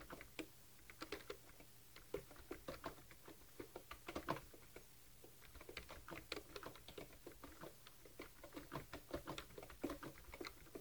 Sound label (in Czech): goldtouch, klávesnic; Goldtouch Foreign Keyboard Layout